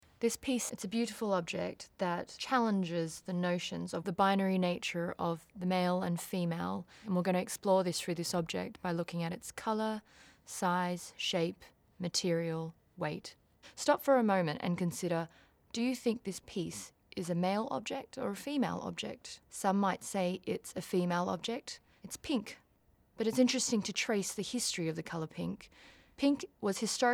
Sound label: Horn; PinkTons